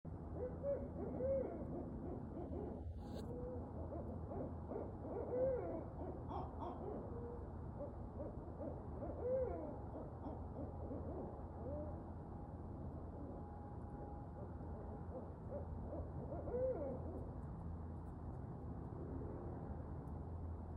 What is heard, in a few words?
Barred owl